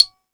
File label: Percussion